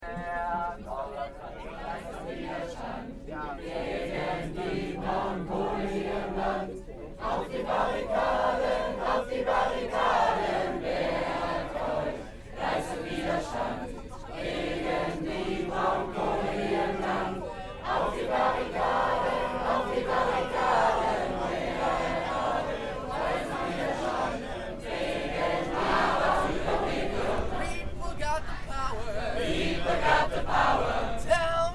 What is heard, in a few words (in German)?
Bahn Busfahrt; Fridays For Future